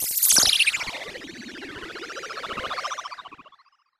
shooting star

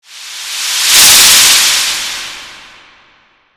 motion; animal

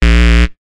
quiz wrong

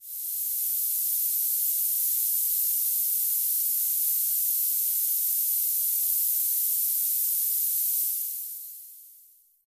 spray; operation

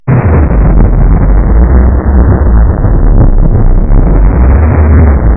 blast

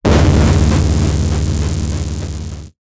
explosion

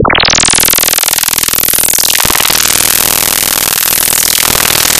laser gun; operation

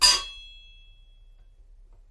metal hit

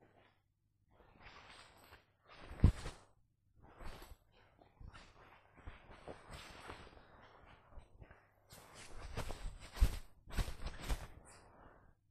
rub the nylon; operation

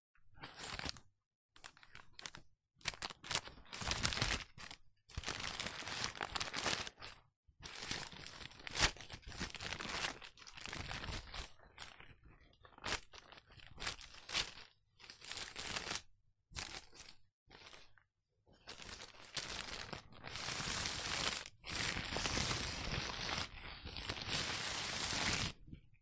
read the paper

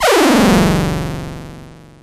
power-down

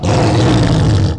roar of beast; animal

